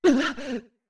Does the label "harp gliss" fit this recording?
No